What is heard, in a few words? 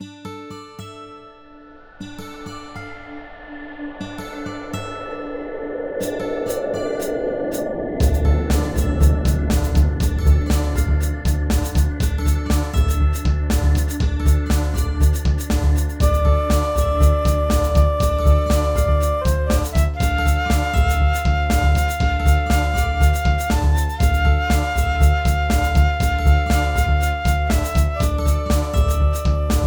Pseudo Acoustic Test